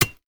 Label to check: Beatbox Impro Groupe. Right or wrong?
Wrong